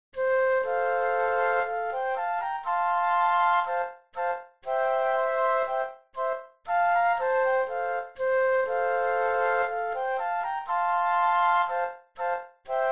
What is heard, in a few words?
flute trio; classical